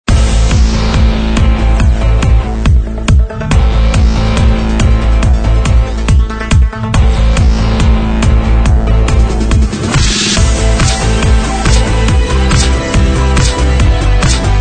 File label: Sport bumper